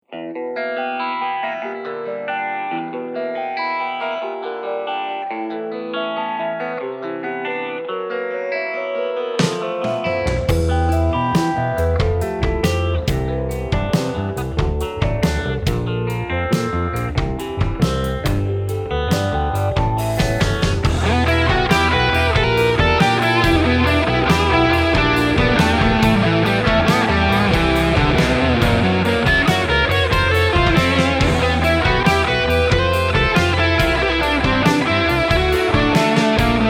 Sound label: Live Improv